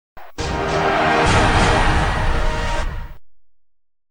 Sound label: jingle; espn calssic